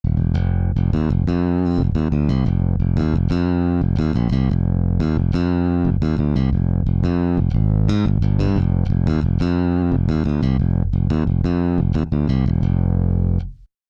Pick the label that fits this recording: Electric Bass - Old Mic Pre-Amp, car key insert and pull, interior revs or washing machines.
Electric Bass - Old Mic Pre-Amp